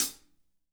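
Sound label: hat closed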